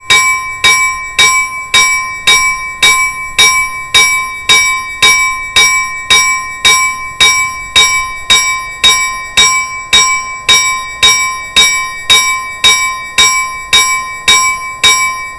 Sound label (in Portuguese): Sino de Locomotiva